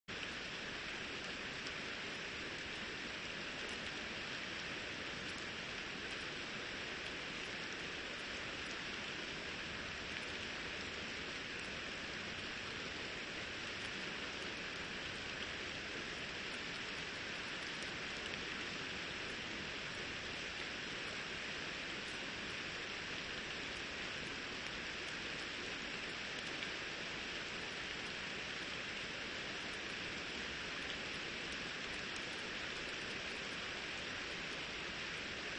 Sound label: riverside; Kyoto U; Ashiu